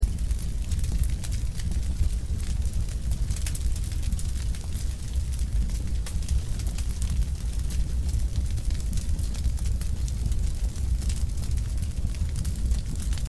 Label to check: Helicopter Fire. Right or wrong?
Right